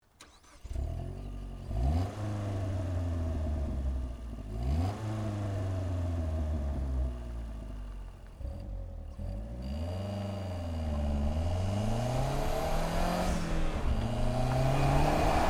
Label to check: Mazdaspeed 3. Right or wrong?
Right